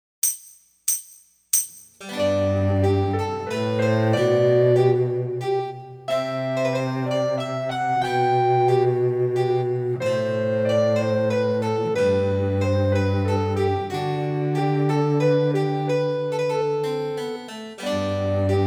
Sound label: Minuet In G, Slow; Notebook For Anna Magdalena Bach